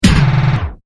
Turntable Scratching Beat Grid; DJ; Musical; Dj Sound Effects

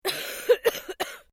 Female cough medium; Female vocal sounds; Clearing Throat; Human

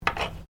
NAIL CLIPPERS PICK UP; Miscellaneous Human (Sound Effects:Human)

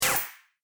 Android Bolt It On, robot

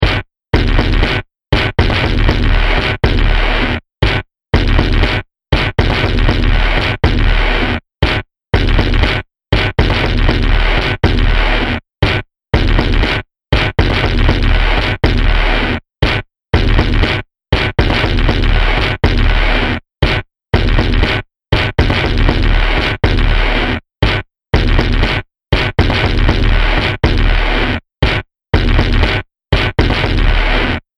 Future War Drums Loop; Sci Fi Music

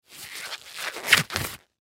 Latex Glove, Latex Glove Rubber Take Off; Hospital Sound Effects; Surgeon Surgery Doctor Nurse Physician; Emergency

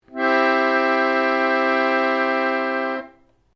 PIANO ACCORDIAN CHORD LONG, Old Piano accordian; Pianos; Musical

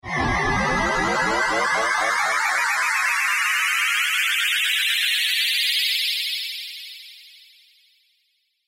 Sci Fi Ascend Computing Digital Bleeps; Computer; Blastwave

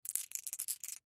Ball Bearing Multiple Hand Roll; Rolling Sound Effects; Foley; Objects; Blastwave

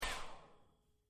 Hairdryer: Domestic, Handheld, Switched Off; Miscellaneous Electronic Sound Effects; Miscellaneous Electronic (Sound Effects:Electronics)

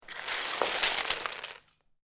Lego, grab several lego pieces; Miscellaneous Toy Sound Effects; LynneMusic

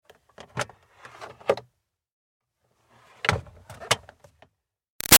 Car Highlander Glove Box O-C Light; 2005 Toyota Highlander V6; Move; Vehicles; Cars; Big Room Sound

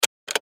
Button Switch

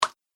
Water Button, button sound effects; multimedia

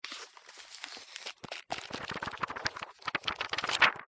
Book Large Flip, Book Flip; Books; Foley

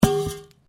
METAL POLES CRASH; Metal (Sound Effects:Materials)

